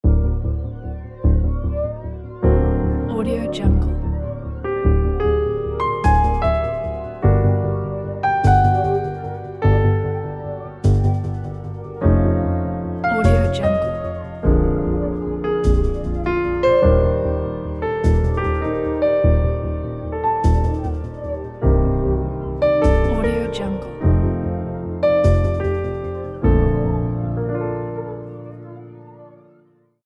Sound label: foreboding pulsating jazzy trailer